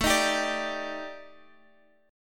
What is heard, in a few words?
D sharp Major 7th Flat 5th, A, guitar chord